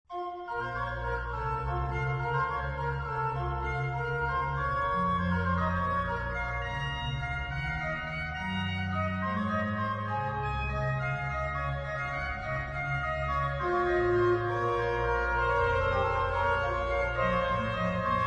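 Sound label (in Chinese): 鋼琴; 德布西; HORTUS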